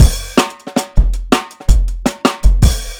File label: 160 live jungle, breaks